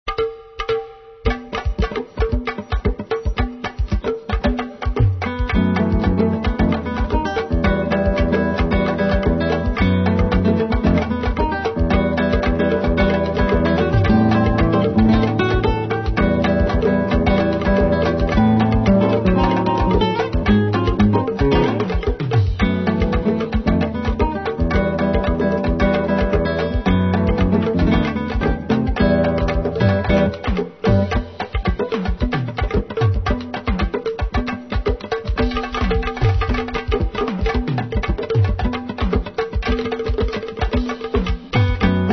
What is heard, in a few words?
Batucada